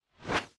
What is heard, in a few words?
WHOOSH Long; stereo; Universal Sound FX; WHOOSHES